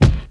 prominent kick single hit b key, kick single shot tuned to the B note